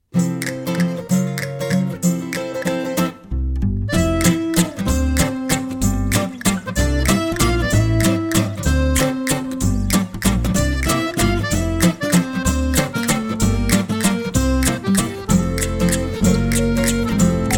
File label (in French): VALSE